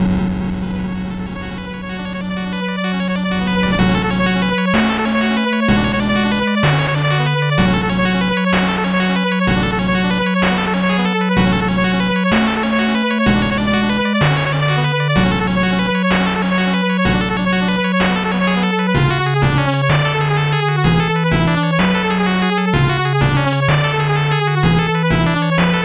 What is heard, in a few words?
8bit bgm fast